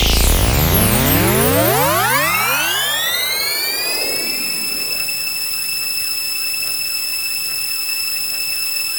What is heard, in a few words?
GAME TOUCH HOLD PERFECT; maimai